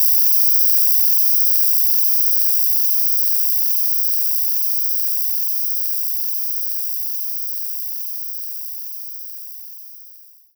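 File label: Vibraphone loop; knights try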